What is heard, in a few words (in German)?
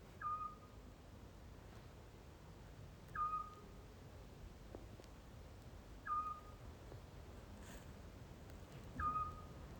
Zwergohreule